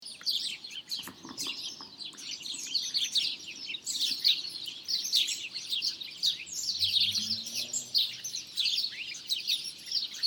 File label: SPARROW CALL Roost with several birds calling